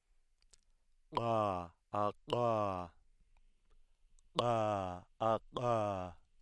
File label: voiced uvular implosive